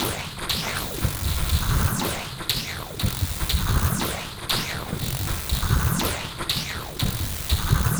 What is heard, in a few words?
Glitched, Processed Percussion